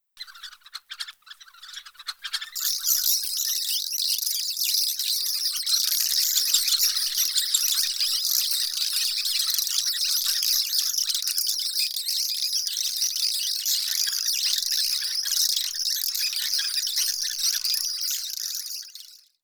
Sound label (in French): appeaux brut